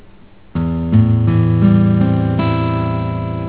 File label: chd am7, guitar